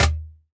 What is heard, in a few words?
Cajon, World Percussion; Cymatics; PHARAOH Beta Pack; Pharaoh Premium Drum Samples (BETA)